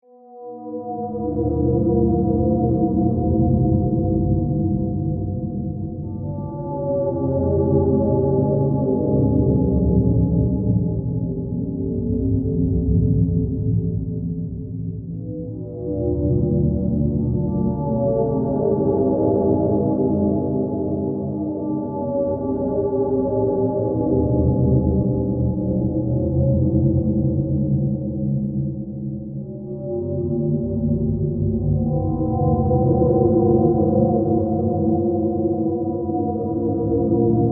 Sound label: Bed Moaning Spirits, Horror, Bed, Moaning, Spirits, Eerie, Drone; Ghosts; BlastWave